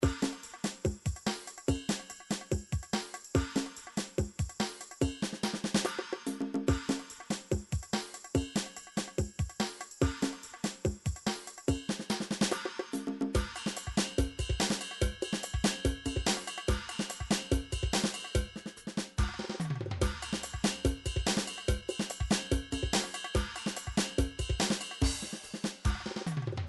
drum midi tsiftete

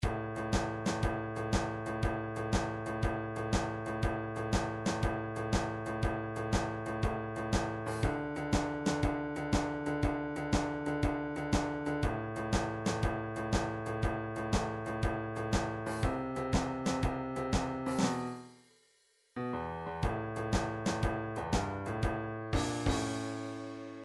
midi drums, blues

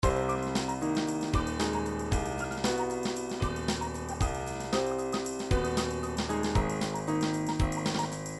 triphop; Newtronic Triphop Construction Kit

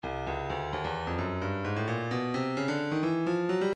breakbeat 130 bpm; Stylus

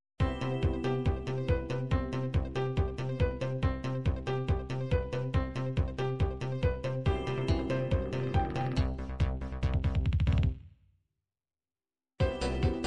techno trance

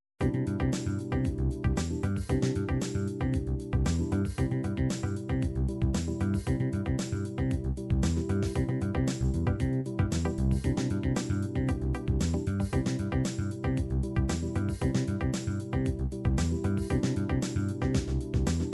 funk, midi

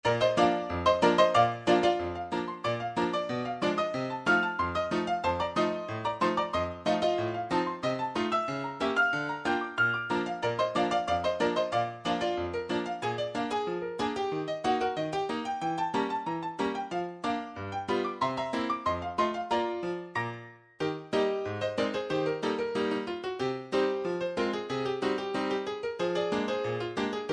piano medley

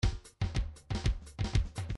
fills latin fill, midi

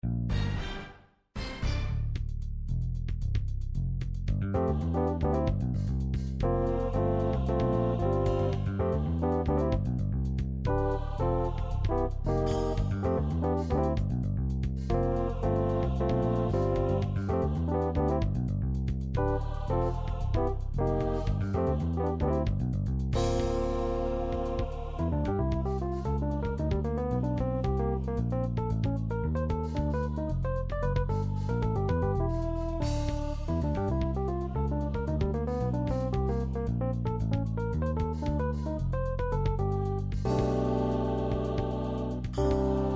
jazz

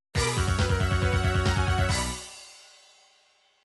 mission accomplished; game system super nintendo; sequenced; metal warriors; sinclairc

